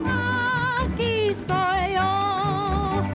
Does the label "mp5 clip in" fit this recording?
No